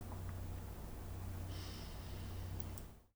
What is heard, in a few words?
snarling cup of coffee